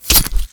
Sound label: knife stab; tfa starwars melee weapons pack